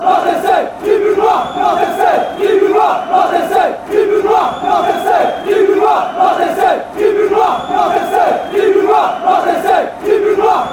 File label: fan chants; nantes fc